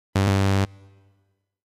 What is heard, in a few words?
incorrect